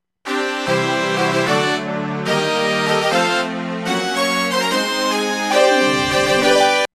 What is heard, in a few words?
Fanfare; Satam; By L4